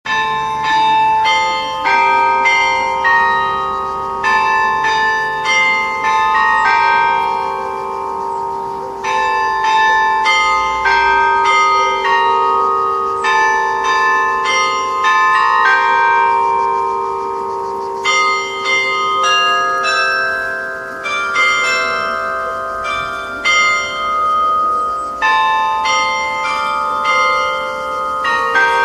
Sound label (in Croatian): zvonovi